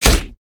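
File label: armadillo basic attack; rammus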